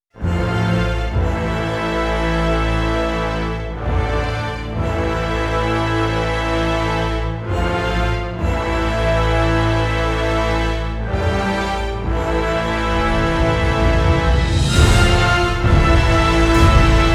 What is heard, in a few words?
live by the sword no choir